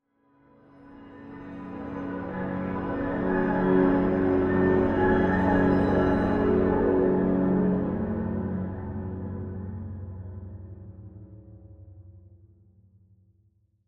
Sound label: Horror Swell Orchestral Eerie Swirl; Scary Tones; Blastwave; Sound Effects:Horror